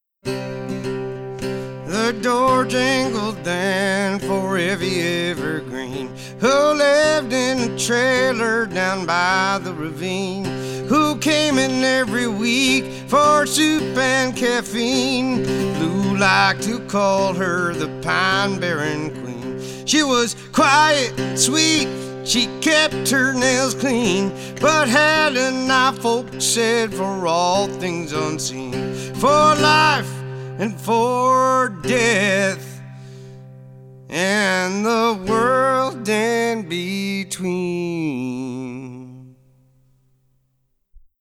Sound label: cues (the door jingled)